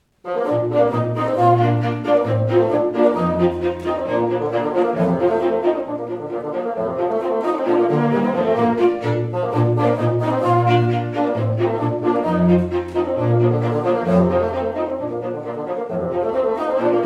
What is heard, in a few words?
Bassoon Quartet; Krommer; 4th mov